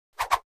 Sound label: Fencing Sword Swish